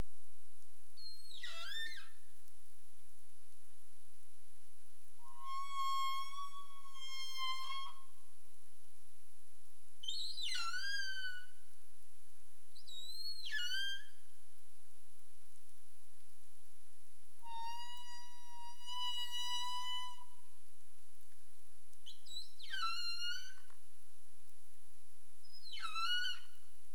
humpback whale; CARIMAM

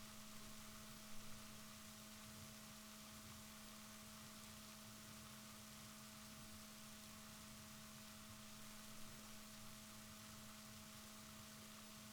rorquals positif, Balaenoptera Physalus Chile

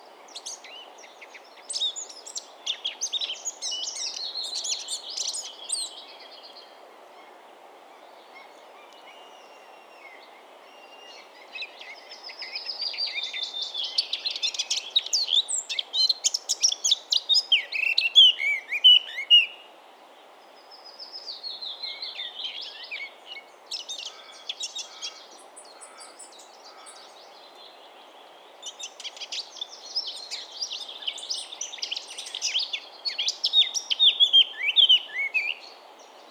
Sylvia atricapilla; SETE

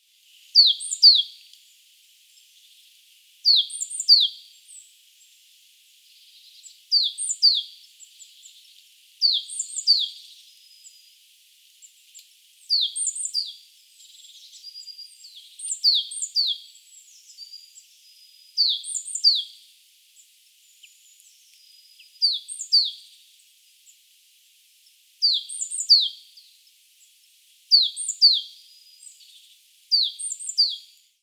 Parus major; SETE

